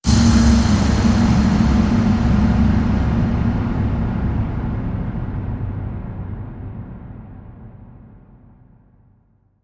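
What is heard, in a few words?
Hit Ambient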